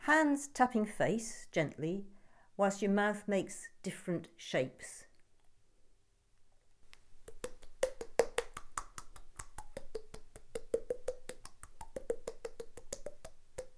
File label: Face taps